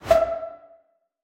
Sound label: Appear